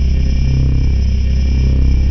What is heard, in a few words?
laser